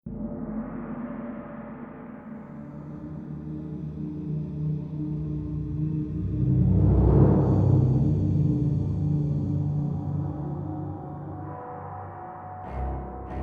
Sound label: Action Suspense